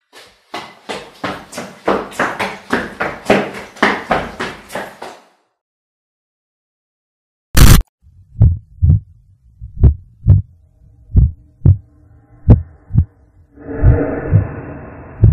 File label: running footsteps sound effect